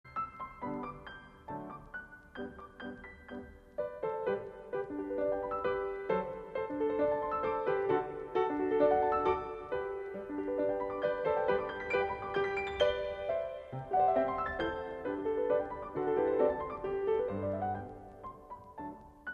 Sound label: chimes; after mozart